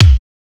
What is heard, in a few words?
acoustic bass drum single shot d key; kick